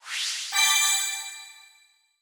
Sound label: Card Upgrade